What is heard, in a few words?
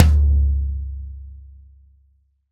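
LD Low Tom, Roland BK-5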